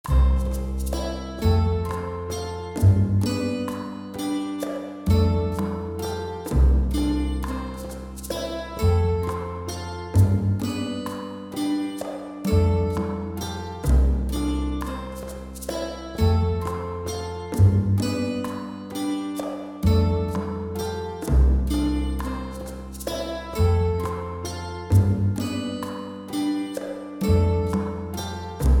HaloVerb Bass Space; Metric Halo v4; Cuba NKI

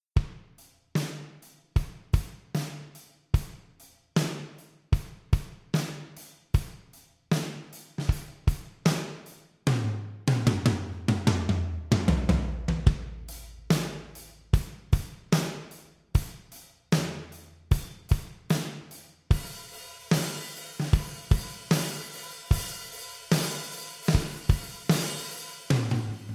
black kit real room

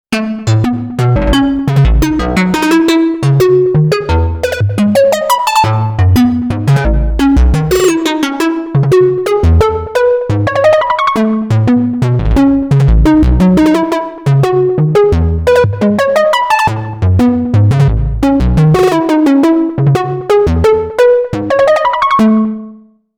Sequential Pro 3 Ice Marimba

